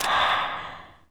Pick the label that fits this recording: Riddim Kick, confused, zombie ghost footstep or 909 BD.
zombie ghost footstep